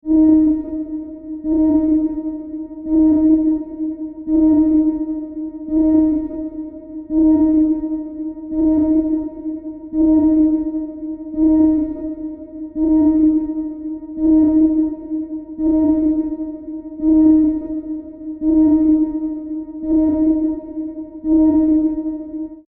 Submarine Warning Alarm; Alarm Sound Effects; Andrew Fleming

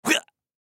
Human Grunt; Grunt Karate Punch Element Male 25 Yrs; Grunts; Sound Effects:Voice

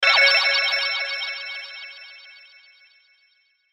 Hit Bright Chime Warble; Production Element Imaging Element Accent Transition; Chime Sound Effects

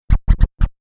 Turntable Scratching

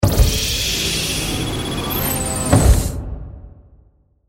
Sci Fi Air Lock Door Seal

